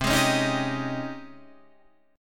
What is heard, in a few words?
G sharp Major 11th C